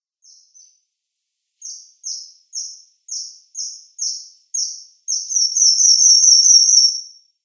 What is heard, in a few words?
Red Avadavat calls